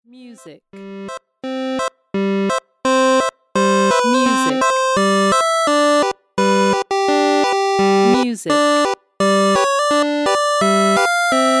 Vtuberトーク配信BGM

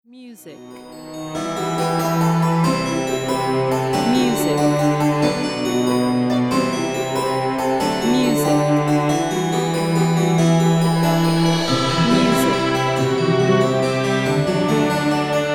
探索ゲームBGM

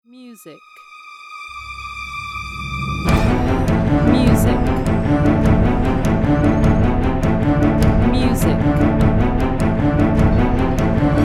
ホラー映画劇伴

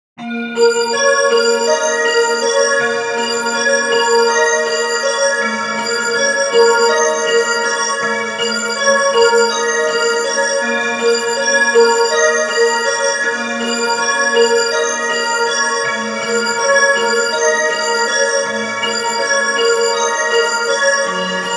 常闇を告げる鐘

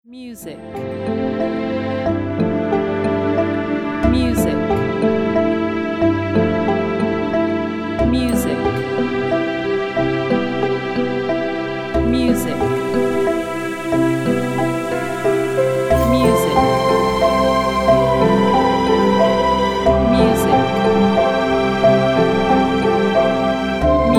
ドローン空撮動画BGM用

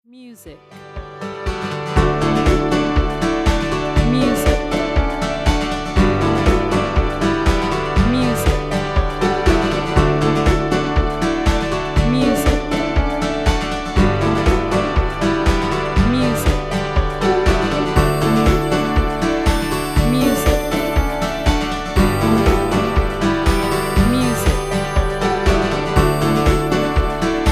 説明動画用BGM

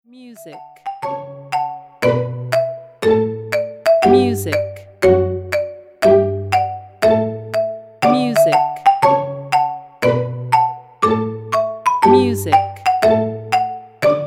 Vtuber配信用待機用BGM

